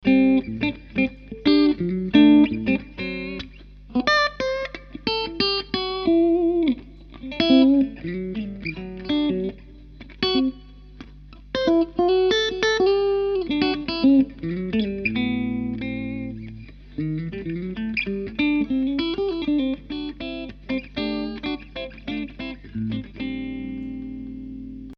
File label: clean gain 12h